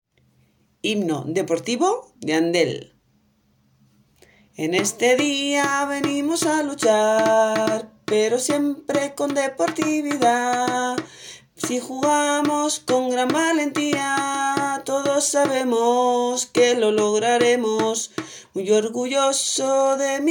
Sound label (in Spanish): Himno Musica; Himno Andel